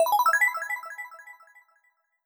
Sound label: accent synth bell e major mono